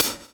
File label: DOWN, DOWN KIT 78, Groove Activator; AMG Gota Yashiki Groove Activator